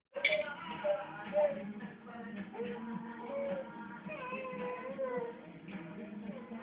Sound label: baby hungry